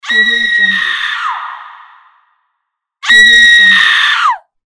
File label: scream horror girl